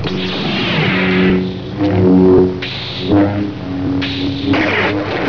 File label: Lightsaber duel; starwars